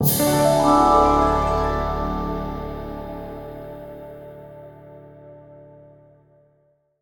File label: stinger new talent; roguebook